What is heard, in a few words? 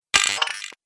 Droid Digital Part, robot; Robot Sound Effects